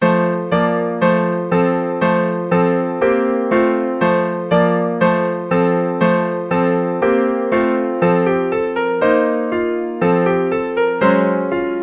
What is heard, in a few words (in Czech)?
dej buh stesti piano; koledy advent